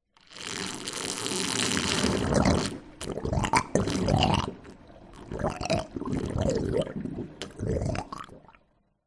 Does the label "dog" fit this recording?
No